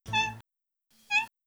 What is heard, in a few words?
Muffin Mew, stream noises; Micro Pack